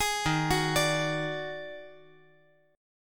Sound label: Eb Minor Major 7th Double Flat 5th; JGuitar